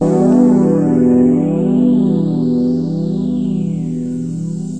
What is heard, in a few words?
seventh minor, Piano and Epiano; single samples